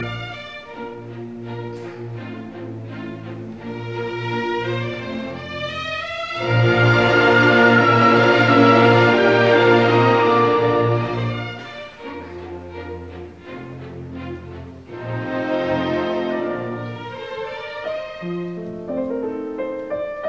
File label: Piano Concerto in D minor, Romance; Mozart